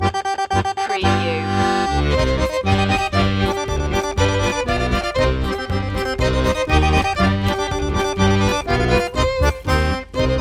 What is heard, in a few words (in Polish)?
kresowa polka loop